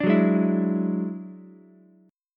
F sharp 7th Suspended 2nd Sharp 5th F sharp down Ukulele; strummed